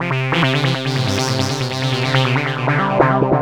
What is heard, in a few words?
140 loop